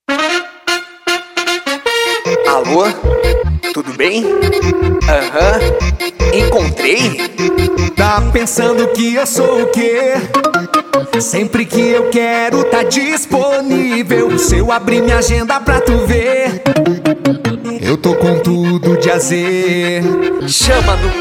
Jingle Parodia; Plataforma Encontrei

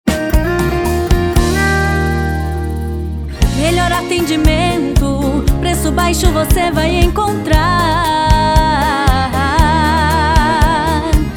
Jingle Farmacia, jingles parodias comerciais; Confianca

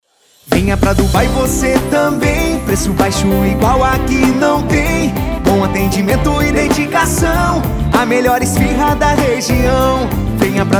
Jingle Esfiharia; Dubai

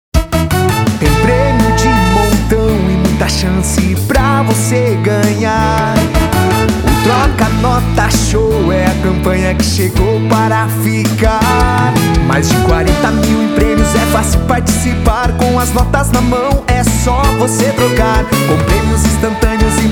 Jingle; Troca Notas

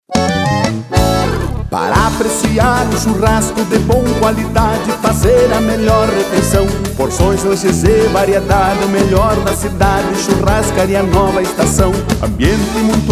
Jingle Restaurante; Nova Estação

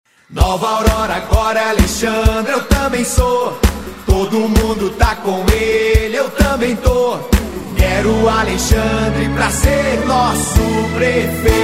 Jingle VEREADOR; Tempo de ideias novas; Alexandre Alcino 11; Nova Aurora

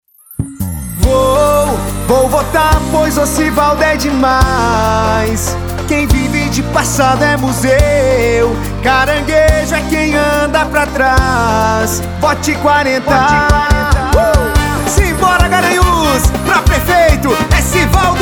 Jingle PREFEITO; Garanhus; RENOVACAO

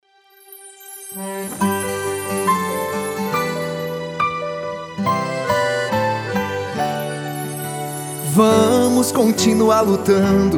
Jingle PREFEITO; Douglas Silveira e Alexandre; Cerrito

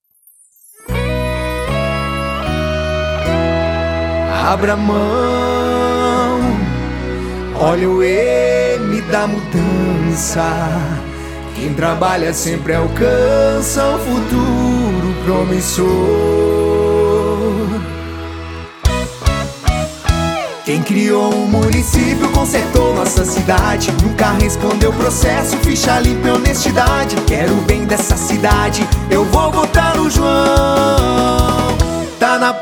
Jingle Continuacao; Palma da Mão

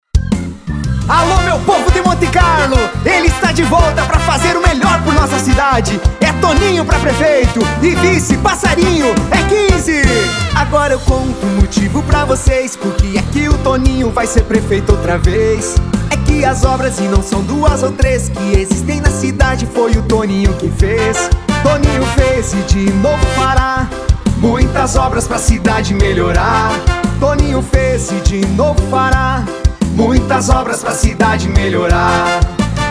Jingle PREFEITO; Fez e denovo fara; Monte Carlo; RENOVACAO VOLTANDO

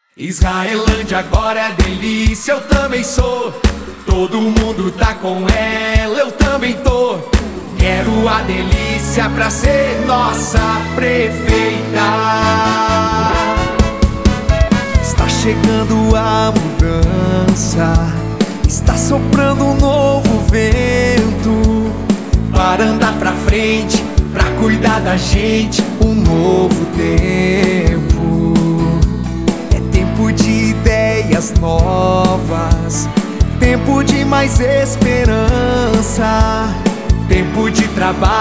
Jingle PREFEITA; Novo Tempo; Adelicia; RENOVACAO

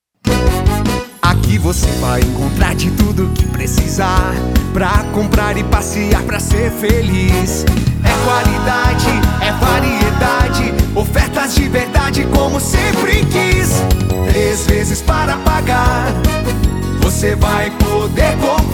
Jingle; Mercale

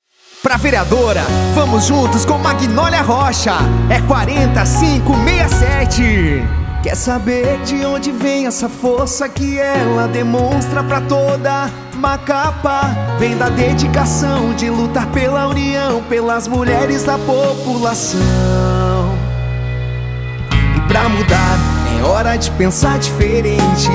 Jingle VEREADORA; Macapa; RENOVACAO

